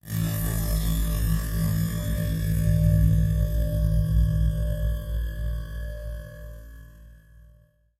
Machine Charge Down; SoundsCrate

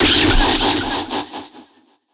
Anime Super Laser

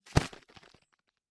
Bird Wings Fly Away; SoundsCrate

